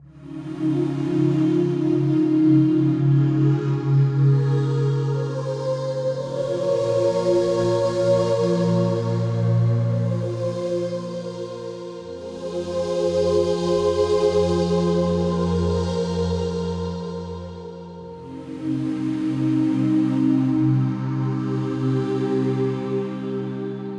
Celestial Pads - Fmin 80 bpm, Atmospheric; MIDI Clips; Tonal; Ableton; Factory Packs; Mood Reel

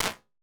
Open Mics; Ambience and Small Rooms; Ableton; Convolution Reverb

